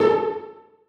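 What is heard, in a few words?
celli pizzicato a2; Sonatina Symphonic Orchestra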